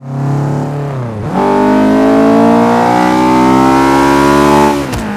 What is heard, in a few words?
no shift, vehicles; gmod; tdmcars; s2000